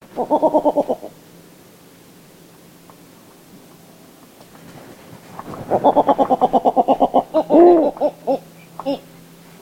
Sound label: Snowy Owl, Bubo scandiacus; owls